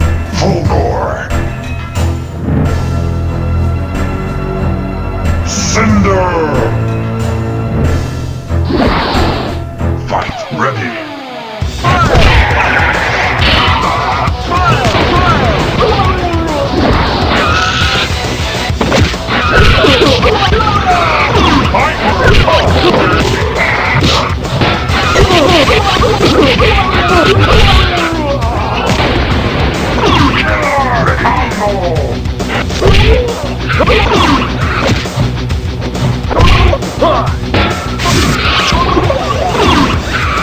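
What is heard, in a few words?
killer instinct arcade; ze freezy xmas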